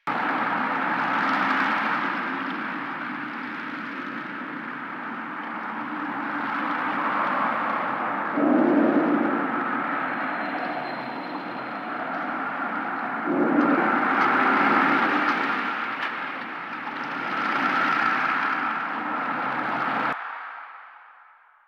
Cave Interior Bed Loop; Ambience; Warframe; Stalker Cave

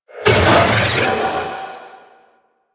Stalker Spark Grenade Throw; Warframe; Sentient Stalker

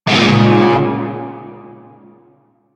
Shawzin Phrygian Chord; Warframe; Narmer Shawzin

